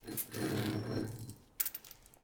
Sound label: Footsteps Chain